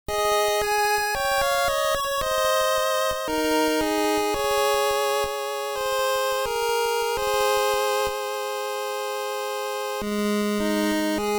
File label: Game Over